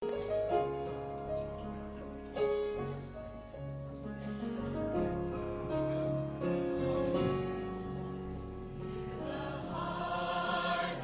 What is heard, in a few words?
choir